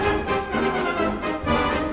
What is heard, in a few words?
clown song